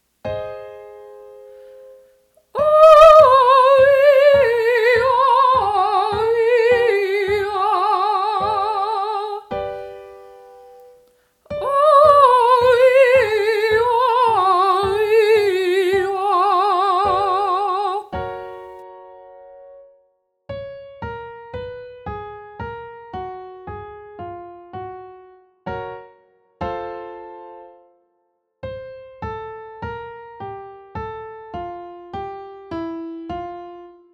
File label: oh eh descending, vocal exercises